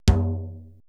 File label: PERC MIX, Retro Sampler; Best Service ProSamples vol.55; AKAI